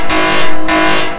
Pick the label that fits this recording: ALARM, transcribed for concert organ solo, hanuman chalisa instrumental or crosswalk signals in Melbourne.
ALARM